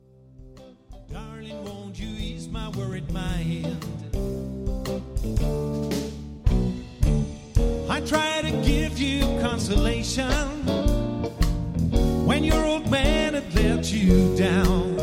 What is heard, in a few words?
part sax